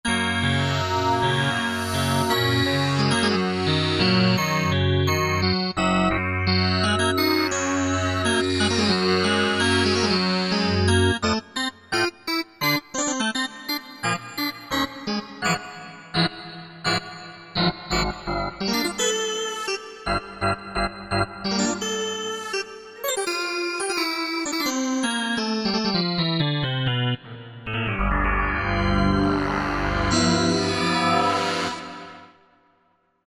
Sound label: Panned Waves, Korg Wavestation A-D